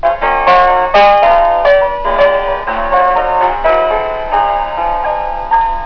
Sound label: keybrd